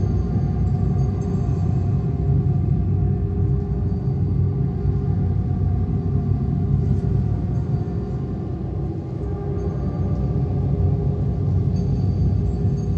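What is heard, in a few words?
eerie ambience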